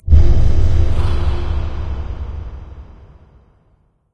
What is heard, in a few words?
advisor blast; source engine; portal2; outland